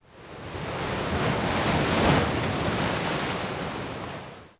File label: Ocean Waves; Civ4 beyond sword